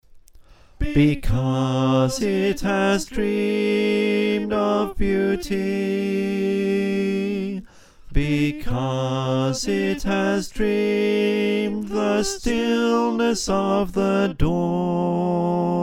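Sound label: Bass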